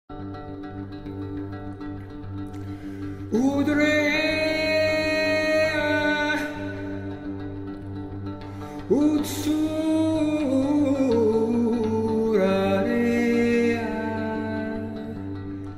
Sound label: hudreeeeeaah